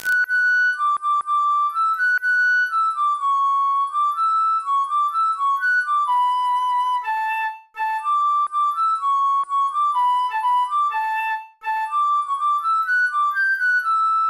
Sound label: Swedish national anthem